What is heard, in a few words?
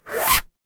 paper fabric zipper